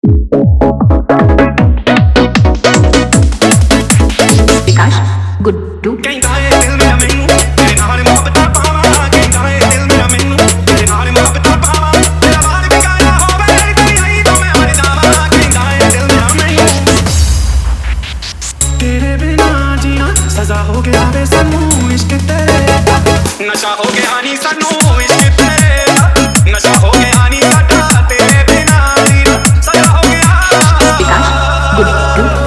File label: Hindi Remix